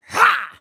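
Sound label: voice punch grand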